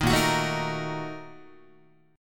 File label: A sharp Major 7th Suspended 4th Sharp 5th, Guitar; strummed